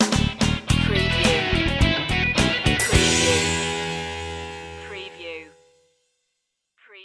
funk rock sting

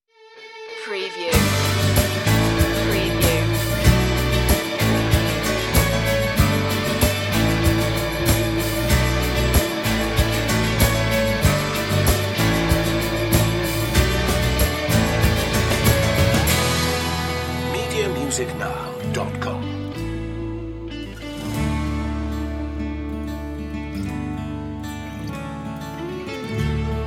guitar version

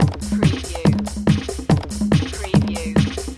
Techno-Trance Drum Loop 142 BPM - Busy Break

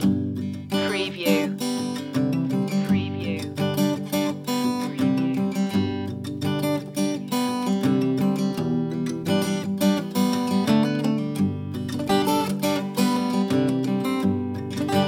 folk

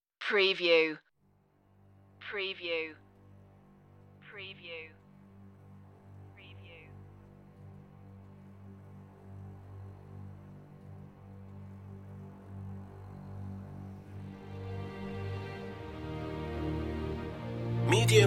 protectors of fate intro part live strings only

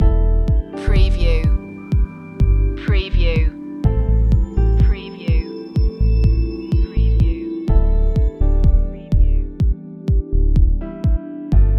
waterfall loop

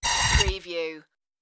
digital slide glitch

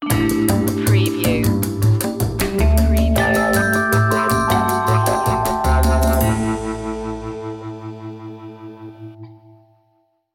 jazzy opener

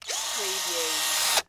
Electric Drill Tight; Sound Effects Bible

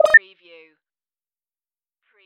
digipanel

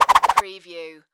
Whip Whoosh Multiple; Sound Effects Bible

